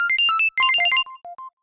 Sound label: Ringtone